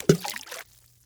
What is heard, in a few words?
Splash